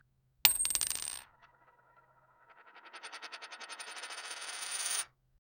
sound of coin flip then; event

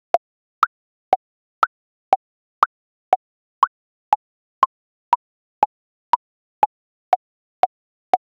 ui hover sound; clicks

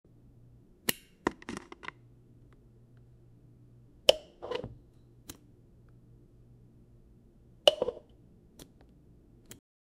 sound of the portafilter, coffee

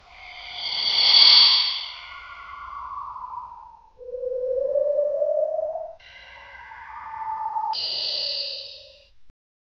distorted slowed down, slowed down

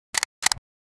clicking sound effect of, camera

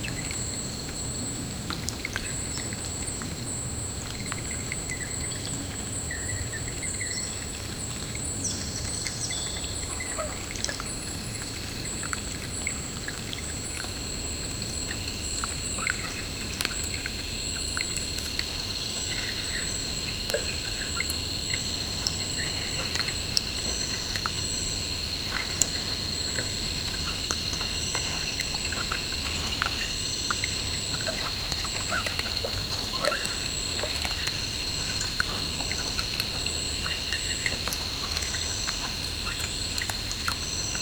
deep in a dense tropical, tropical

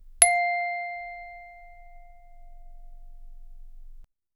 a clean dry audio recordi, handpan

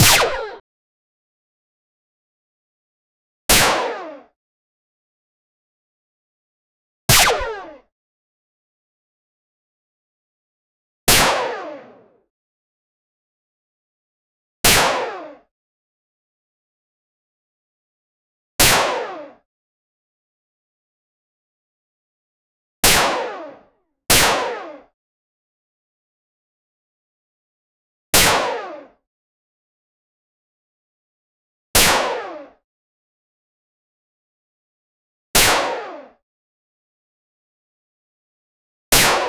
fn scar assault rifle wit

